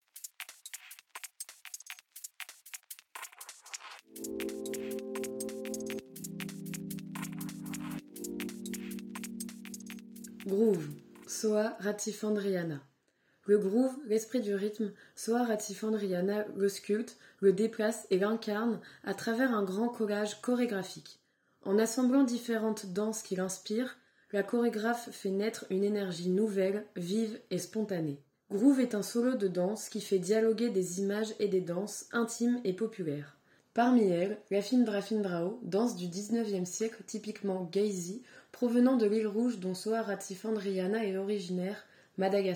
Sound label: groove mix